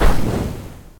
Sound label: fire boom